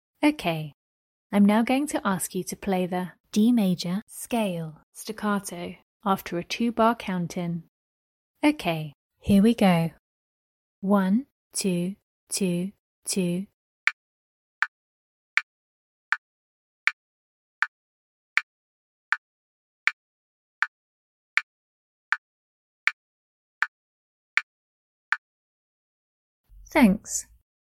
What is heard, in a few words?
d maj scale staccato